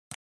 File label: OS Sound Mouse